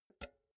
pull out jack multi socket fast; objekte; elektronik

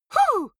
female fight "huh"; vocals; comedy-cartoon; soundpool

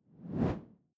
whoosh simple steady low; swooshes-swishes

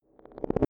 sci-fi flashback; swooshes-swishes

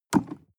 sports car int pedal gas step hard; verkehr transport; autos

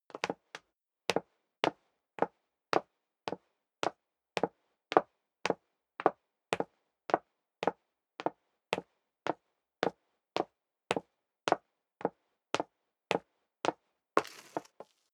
step high heels wood parquet upstairs sequence

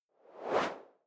whoosh simple steady; swooshes-swishes

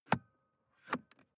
sports car int switch press and release

